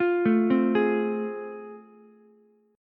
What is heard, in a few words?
A Minor Major 7th Sharp 5th, Ukulele; arpeggiated; AmM7#5; 10,9,8,11; JGuitar